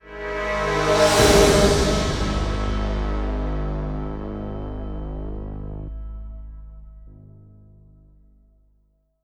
Jump Sting; Rising Threat; Europa

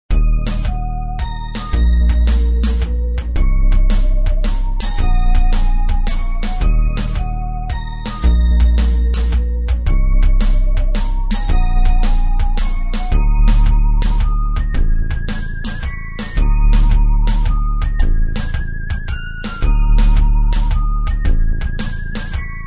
Loop Slow; Trick or Treat Smash Online; Windows

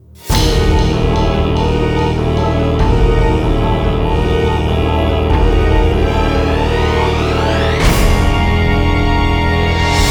Course Intro Fanfare; crash bandicoot series sound box; La Brea Car Pits

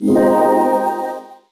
Success Logo; superlite 2500 custom mahjong

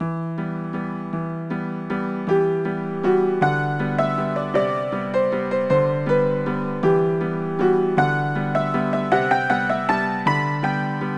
Dance Walzer Fast; Knigge Spielend zum guten Benehmen; NDS

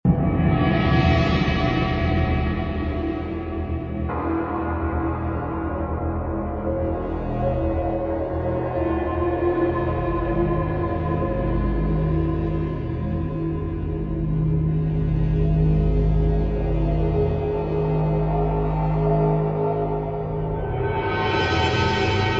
Ambience; Mace Griffin Bounty Hunter; ps2 windows xbox

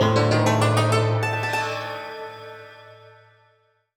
Jingle Puzzle Solved; Samurai Jack: Battle Through Time

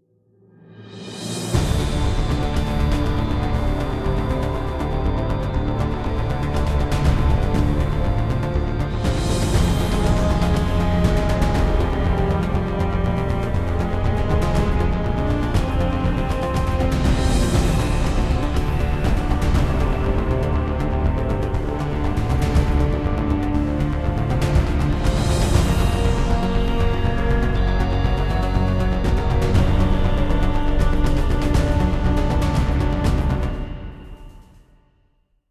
Combat; mass effect 2; Illium Cargo Terminal

